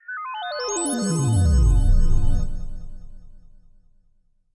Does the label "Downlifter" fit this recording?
Yes